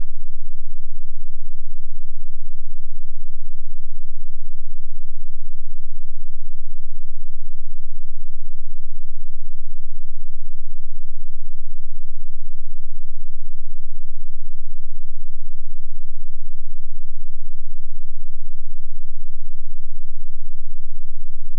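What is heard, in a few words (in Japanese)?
8 Hz, 信号音; 地球の基本振動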